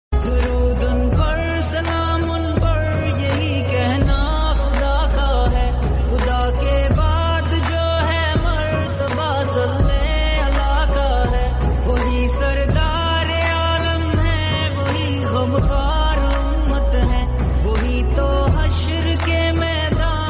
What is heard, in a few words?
islamic